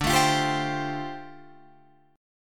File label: D Minor 11th D down Guitar Open F; Dm11 strummed